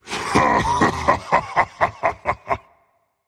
laugh rage; chaac

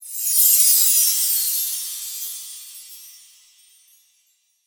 fire sparkle; smite; chiron

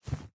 footstep; smite; cabrakan

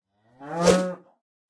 cow; lobby victory; Smite; god Kukulkan v5

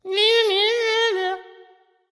tongue; smite; loki

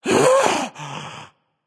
sigh, voicepack; smite; jorm

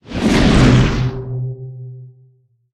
activate; smite; cabrakan

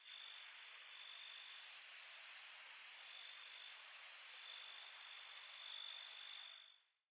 ambience insect; smite

